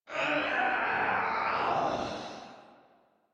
Death; Warframe; Leekter; en

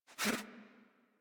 Catbrow Pet Alert Snort; Warframe; Pet Ship

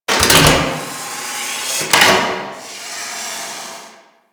Hydarulic Mach Movement; Warframe; Ambience; Corpus

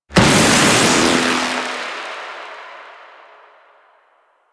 Shipyards Lightning; Ambience; Exterior; Warframe; Grineer Shipyards

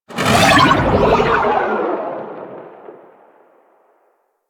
Insect Liset Fly By Quick, Vehicles Flight

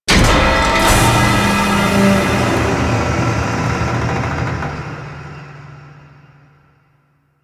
Blast Door Pressurize; Warframe; Corpus Infested; Golem Assassinate

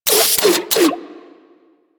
Bubblegun Pistol Reload; Warframe; Weapons; Tenno

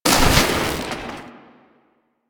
Debris Fabric; Warframe; Ambience; Entrati

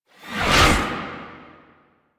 Impiety Riser; Warframe; Warframes; Dagath

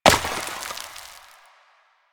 Destroy Planter Hanging

